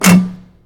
Shotgun Close

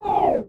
Cyber Off

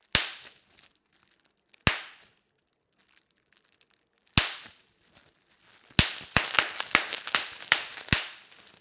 Bubble Wrap Popping Snapping

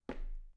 Step on wood

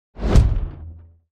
Cinematic Woosh

